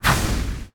Dash

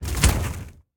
Wood Punch

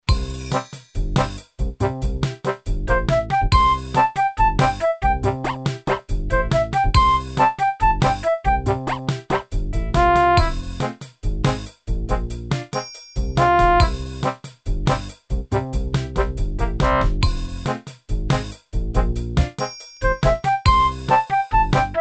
Ring Tone (Poly); Smeshariki: Kaleidoscope of Games

